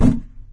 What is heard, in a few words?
plastic barrel impact soft